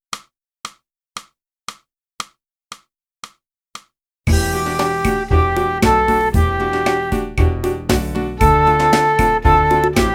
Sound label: flute; boosey